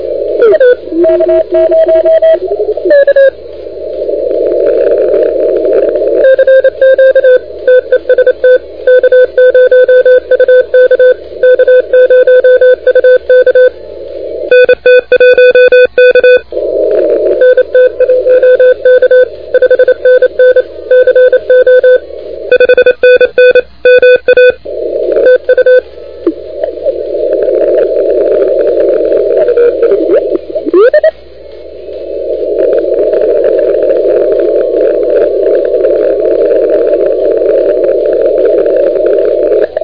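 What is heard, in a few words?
continuous wave 28MHz; ARRL10M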